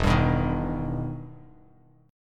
E Minor Major 7th E down Bass Standard; EmM7 strummed

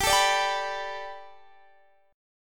B Minor 7th Sharp 5th G, chord

